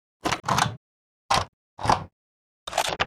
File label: reload, weapons; Dystopia; mk808